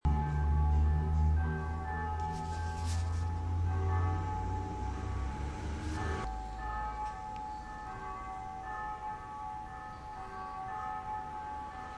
church bells in bromsgrove

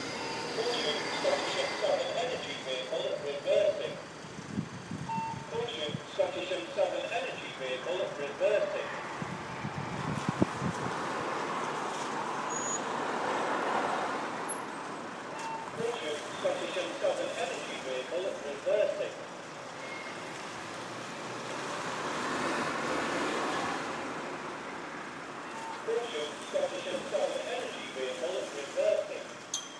caution vehicle reversing; scottish southern energy

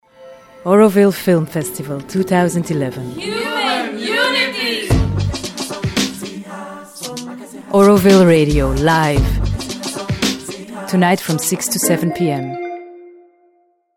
jingle; avradio

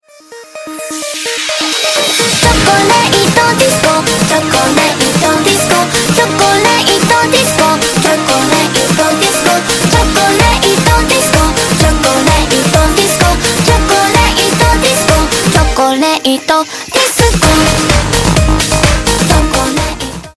ringtone

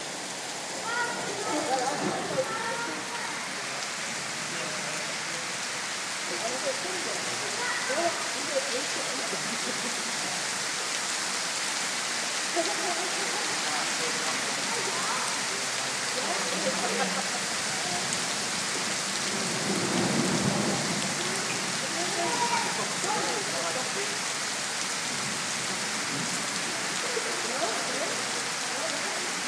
thunder and lightening

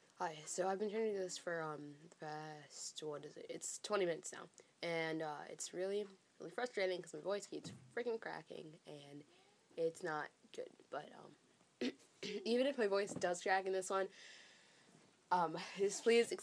screaming

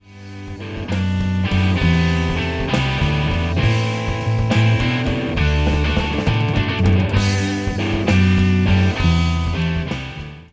monster drum fill

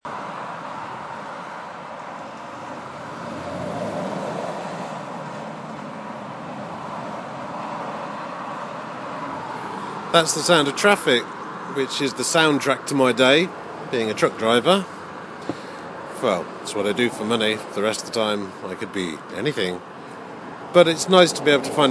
truck stop

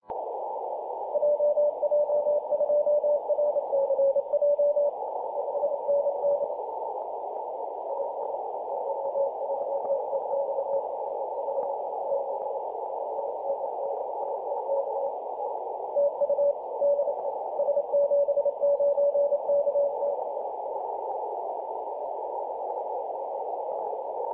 14.043MHz CW

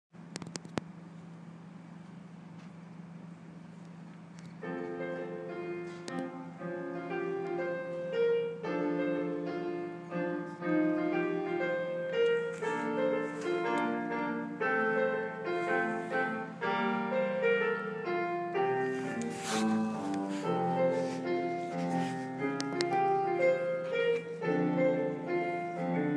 piano recital

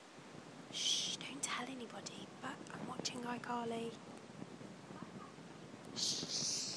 shhh

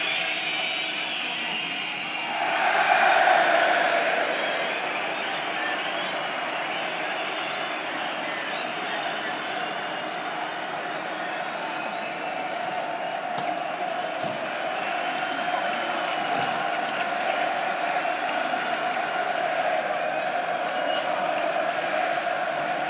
noise from outside the stadium at final whistle; new den stadium; millwall fc; league one

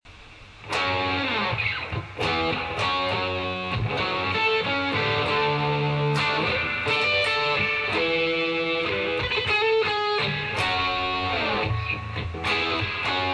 rough guitar idea

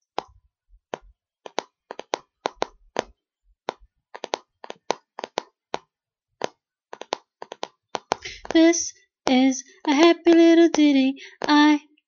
singing and dancing

